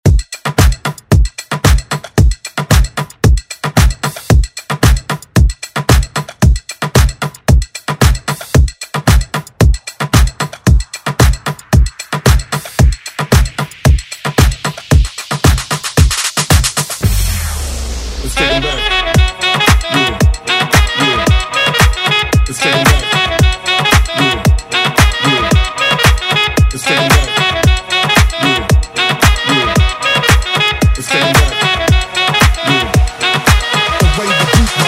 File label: Moombahton Redrum